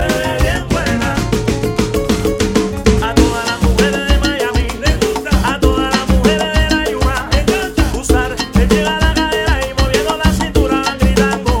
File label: loops cubatons